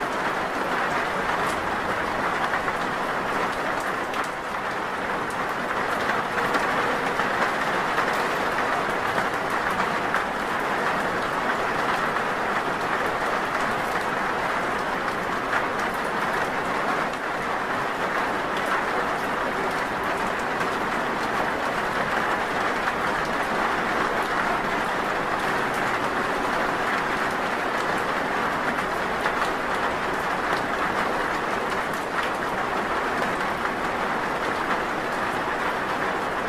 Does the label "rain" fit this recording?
Yes